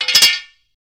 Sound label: Metal Stone Drop, swords